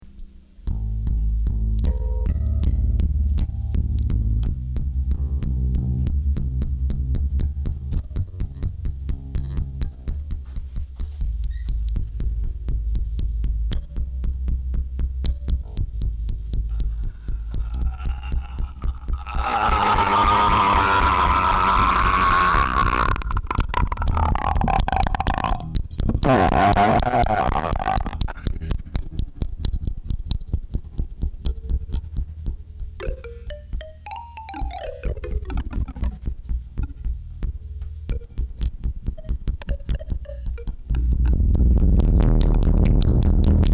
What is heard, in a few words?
Gutteral Scream